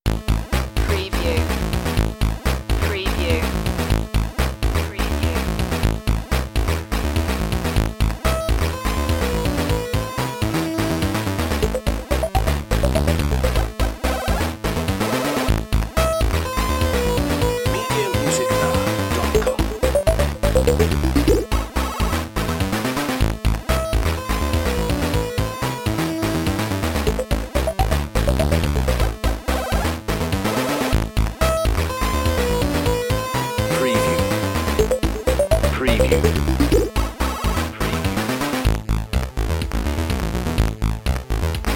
retro video game

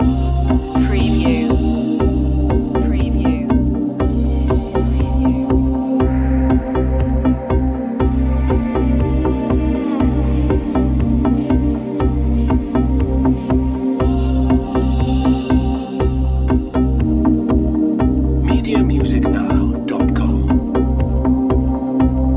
downtempo sunset loop

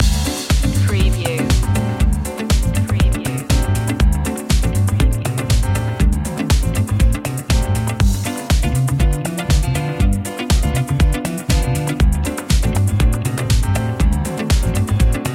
funky blues loop long; media music now

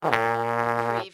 Cartoon Trombone Fart

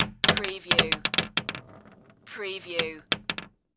Roulette Ball

